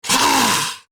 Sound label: Melee Attack Grineer Ghoul Sargeant; Grineer Ghoul Drill Sargeant; Battle Barks